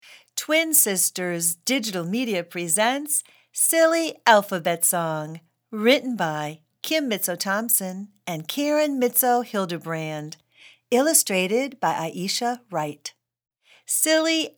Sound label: Silly Alphabet Song English